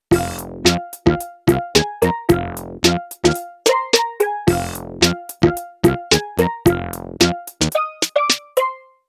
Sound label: Casio SA 80; Song aus Casio Sounds: 99 Drums, 38 Bass